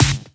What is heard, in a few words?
Snare; Vengeance Samples